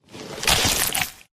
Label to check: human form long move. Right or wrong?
Right